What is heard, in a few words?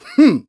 Grab; Ghirahim